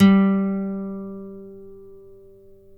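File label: NYLON G 3, guitar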